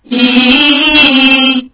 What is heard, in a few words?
Laugh; baldis basics